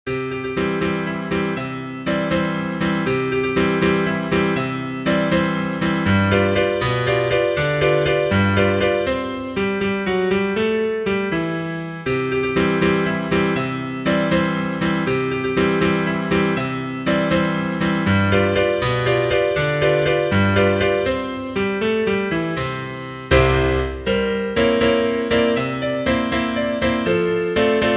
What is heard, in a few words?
PIANO